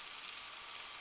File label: Boat Noise